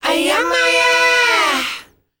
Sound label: KIDS, S. AFRICAN, World Voices; ILIO; Vocal Planet